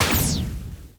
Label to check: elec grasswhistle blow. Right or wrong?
Wrong